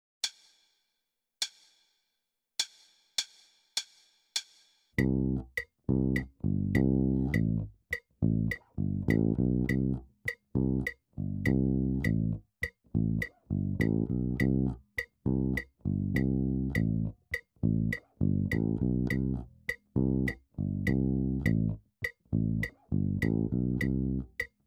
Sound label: Rock